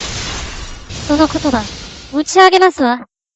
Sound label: cross swords; sora